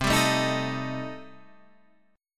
C Minor 6th, chord

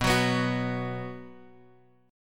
B 5th B down Bass Standard; strummed; JGuitar

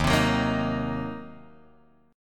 D sharp Minor 6th, Guitar, Drop D; chordshape

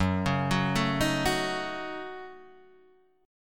A 6th Flat 5th Gb, guitar

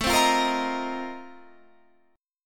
F 11th A, chord

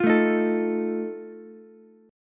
E flat Suspended 2nd Flat 5th, Ukulele; strummed; chord; JGuitar

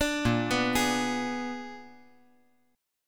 Bb 7th Flat 9th Bb; Bb7b9; arpeggiated; JGuitar

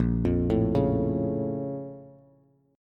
Bb Minor Major 7th, Bass; BbmM7; arpeggiated; JGuitar

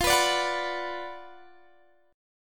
D 6th F, chord; D6/F strummed

